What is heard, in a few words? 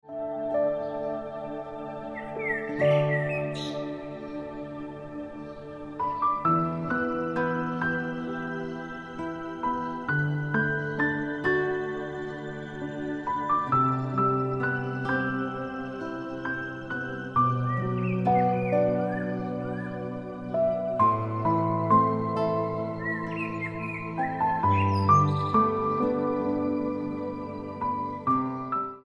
Piano Music